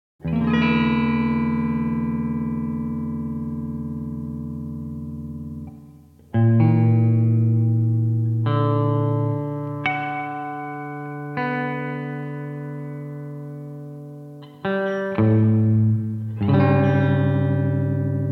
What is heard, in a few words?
sonata for solo 17-tone guitar somber and deliberate